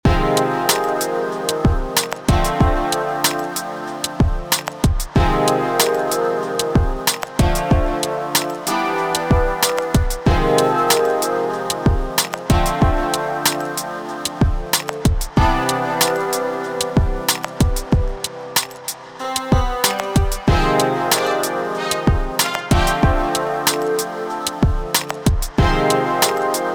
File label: brassy